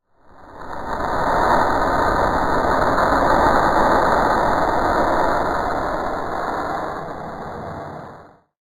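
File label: Science Fiction Arcade Spaceship; star wars / trek style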